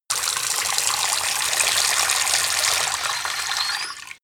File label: tap water run; gastronomie; berufe